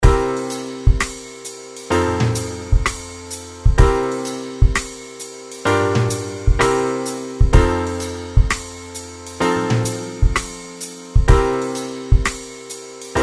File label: Blues II V